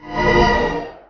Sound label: Mage shadows